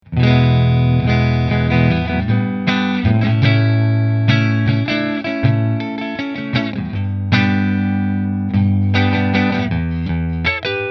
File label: Nola Model 8 Neck Fender, guitars; New Orleans Guitars Model-8 Sunburst